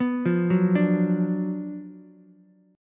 Gb Major 7th Suspended 4th Sharp 5th, Ukulele, GbM7sus4#5 chord; arpeggiated; JGuitar